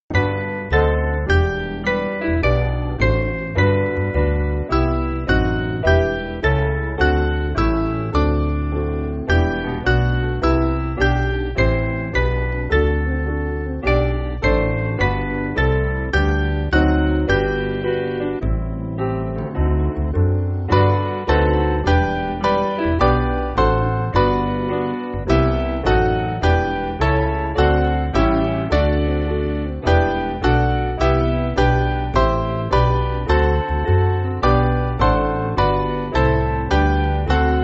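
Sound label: Piano, Music Backing; Vienna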